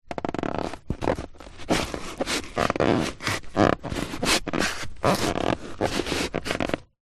vehicles car driver side feet shoes movement scuff carpet floor pedals creak; toyota rav4; zapsplat